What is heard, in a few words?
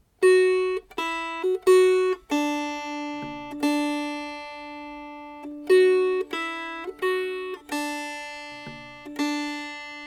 Rubber Dolly Melody